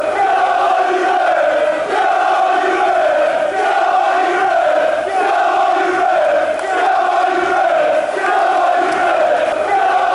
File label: come on you reds fast, fanchants